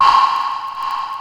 Fairlight CMI III Ambi Bell Hi

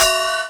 Prepared Drums

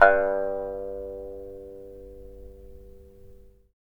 SAN XUAN G3; Spectrasonics; Heart of Asia

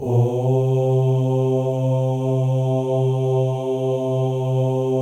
male ohs; Spectrasonics; Symphony of Voices

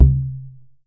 CHANG GO, KOREAN DRUMS; AKAI S6000 CD-ROM; WorldSounds

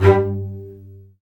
STR BS PIC, Low Marcato; Roland - String Master Series; Combos 1

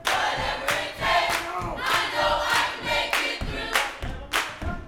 Vocal, Gospel, PHRASE; Spectrasonics

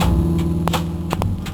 Krackly Loop; Mo' Phatt; Digital Sound Factory Mo' Phatt

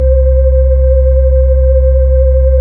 B-3, Hollow; Keyboards of The 60's and 70's

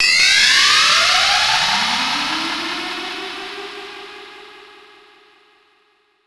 Rising Tines; Zero-G; Soundclash Nu Dance Electro; Acidized Wavs; FX Atmospheres

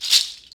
Goathooves Shot, Percussion, Shakers

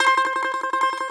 BAGLAMA T15L; E-MU Producer Series Vol. 7 – Old World Instruments

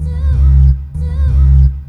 lop 126bpm, House Instr and Stab Loops

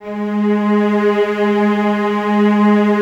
Cellos, String Ensembles; G#3; Miroslav Vitous; LINKS ORCH

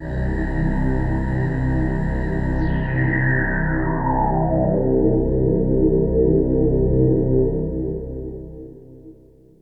Yamaha CS1x filtered riffs; FM 57 - The Ultimate Sample Collection (1997)

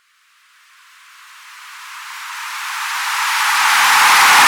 Reverse Cym, Cymbals

